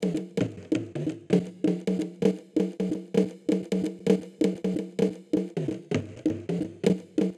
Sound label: Cn-Fx Loop 130, Perc Loops 130, Loungin House; Equipped Music